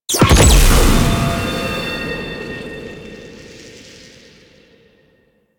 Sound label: Orphix Shield Explosion; Warframe; The New War; Sentient Devourer